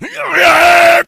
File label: become enraged, infected, male; left4dead; Source engine